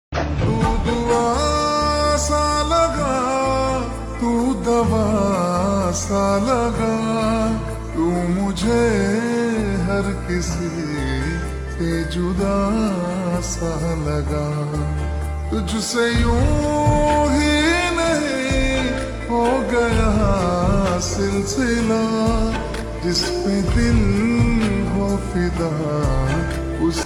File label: Ringtone Slowed Reverb